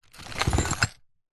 foley cardboard box small containing metal screws and other pieces sudden movement; zapsplat